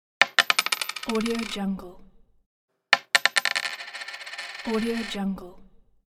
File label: coin; alexsandal